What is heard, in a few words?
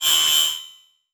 Digital; Adobe; Multimedia Internet CD-Rom Flash